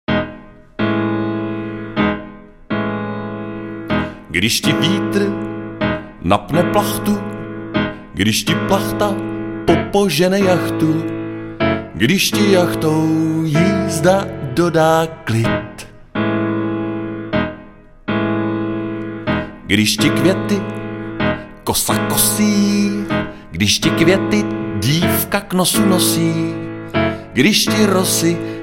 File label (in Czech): klavir